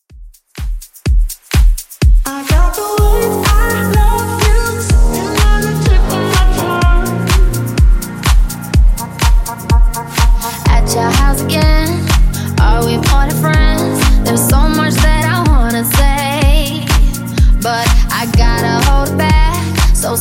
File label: Top40 Redrum 125bpm; remixplanet